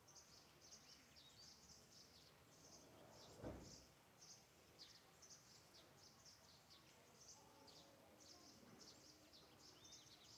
China, Exterior, Atmosphere, Birds, Trees, No Traffic; Shutter Sound